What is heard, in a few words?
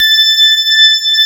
CLAV, FUNK FM CLAV